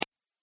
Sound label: Pc Mouse Single Click